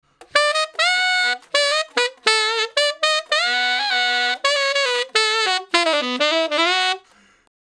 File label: Ten Sax Straight (B), R&B; Solos